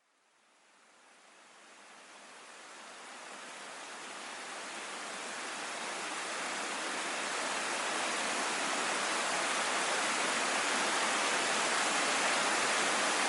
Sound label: Lower Falls; TodCreek; fadeinout